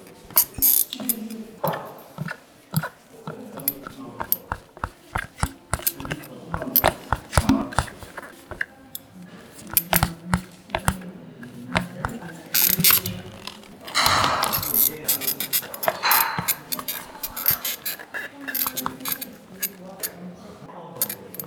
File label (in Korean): 의자 소리